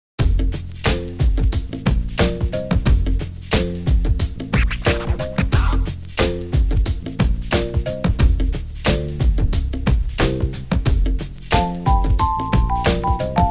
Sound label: Some Ambience; America's Next Top Model